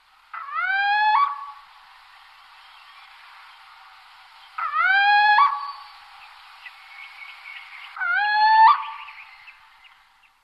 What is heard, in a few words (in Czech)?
Sycek obecny teritorialni hlas